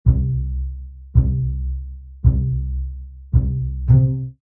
Orch Bss Pz C, Orchestral bass pizzicato C Major 110 bpm.; Bass Loop Pizzicato C Major 110 bpm